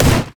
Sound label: ancient golem basic attack; monsters; LoL